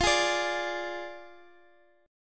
F Minor 6th

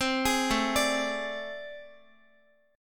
A Minor Major 11th, down, Guitar; AmM11 arpeggiated; JGuitar

